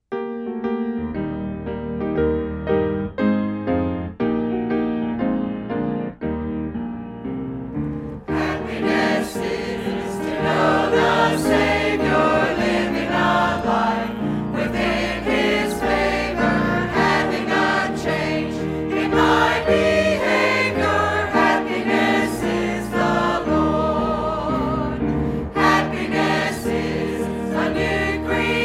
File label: Choir